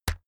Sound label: keyboard external key hit push